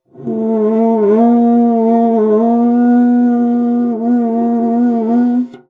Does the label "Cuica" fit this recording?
Yes